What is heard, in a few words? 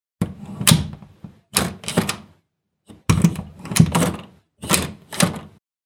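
door handle sound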